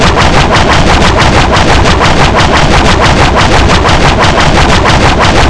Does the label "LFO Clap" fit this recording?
No